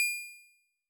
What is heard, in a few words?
bell